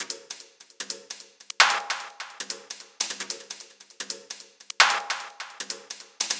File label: Top Loop 150 Otherworlds, Abstract Percussions; Loops; Ghosthack; Drum Mega Bundle Demo; Abstract Percussions Volume 4